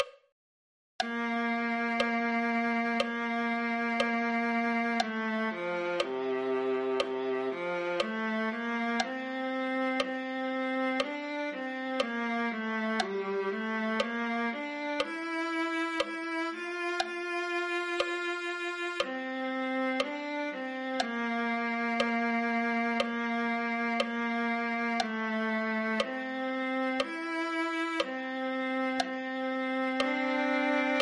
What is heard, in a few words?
viola